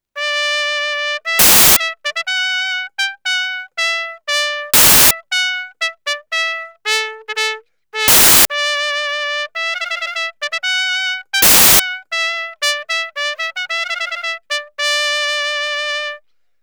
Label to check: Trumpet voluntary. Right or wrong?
Right